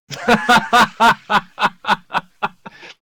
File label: Man laughing